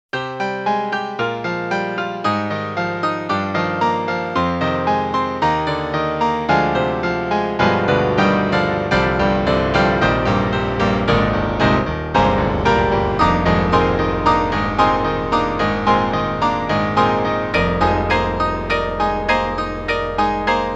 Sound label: E-MU Proteus1, Piano Drama; PopRock